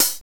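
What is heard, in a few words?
closed tight, hats cymbals; Zero-G; Funky Elements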